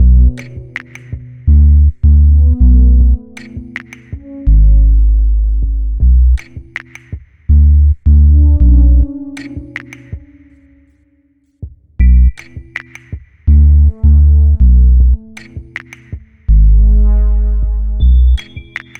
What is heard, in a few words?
stem outro; Pix the Cat